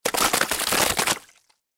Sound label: Impact Mud Drop Splatter Thick Liquid Slime Foley; Low Impact; Blastwave